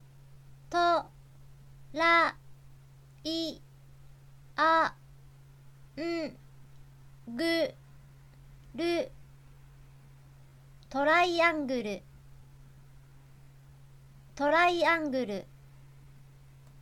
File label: triangle